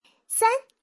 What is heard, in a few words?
countdown 3